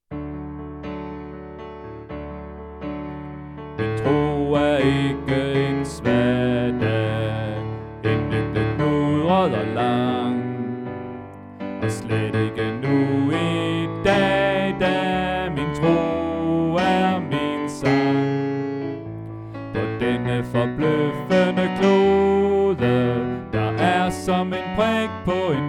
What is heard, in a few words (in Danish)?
Bas; oevefiler